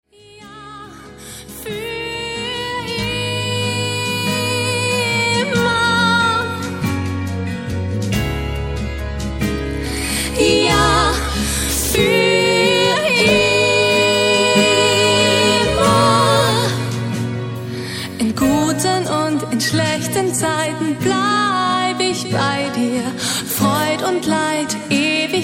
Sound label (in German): mit Chor